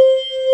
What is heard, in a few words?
BOTTLE VOX; SONIC ELEMNT; SONIC EL YES